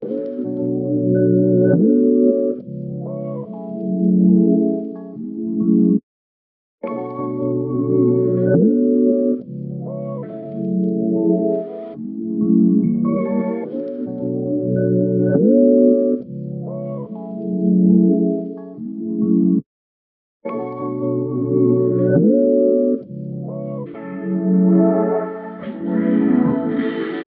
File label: neo soul loop; wavefield